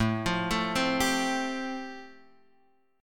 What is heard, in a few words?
A Minor 7th Sharp 5th, down, Am7#5 chord; arpeggiated